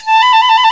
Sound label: FLT FIFE, British Fife, Fife Trills